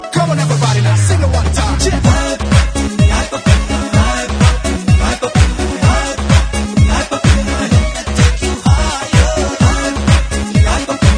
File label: Remix